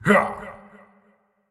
Effort Short; Marv

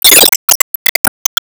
Meteor Shoot; disney star smash android ios mobile gamerip 2020

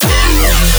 Bass Growl Squelch 150 F; Jino

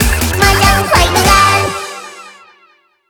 BGM Reach

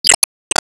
Keep Unlock

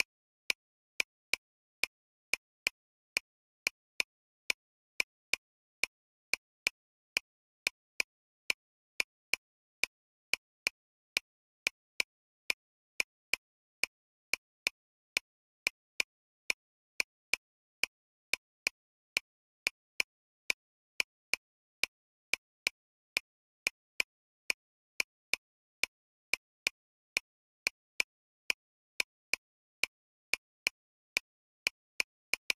Metronome; underhero linux macos windows gamerip 2018; Salserissimo - Metronome 2

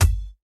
Weapon Rubber

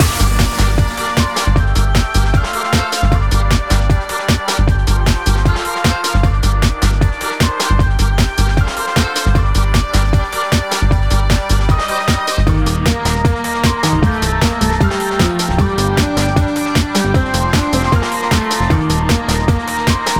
Lighthouse Loop

